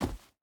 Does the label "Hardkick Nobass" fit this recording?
No